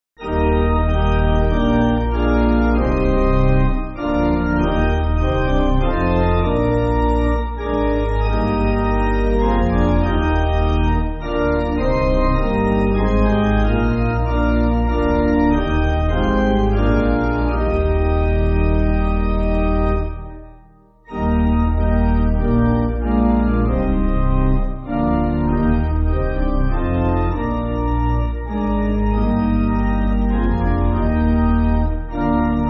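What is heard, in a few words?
Pipe, Music Backing Files for Churches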